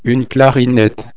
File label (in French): clarinette